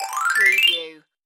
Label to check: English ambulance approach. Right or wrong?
Wrong